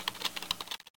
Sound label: hacking